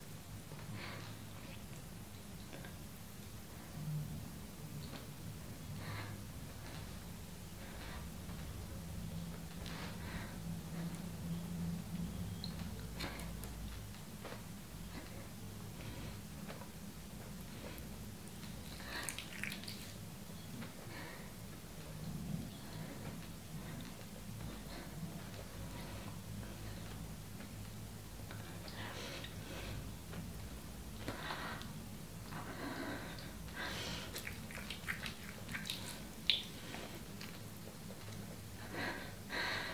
2 Lovely Orgasms, Build up, Nice wet noises, Tiny voice; F4M